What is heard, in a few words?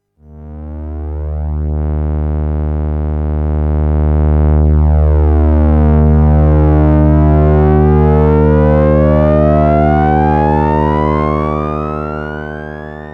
LFO Sync Solo, synthesizer; GRP A4